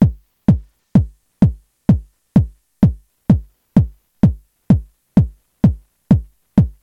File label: bassdrum